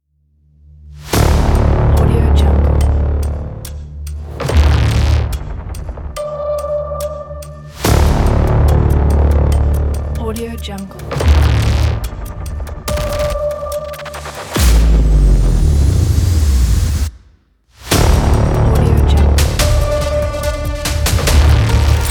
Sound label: countdown trailer teaser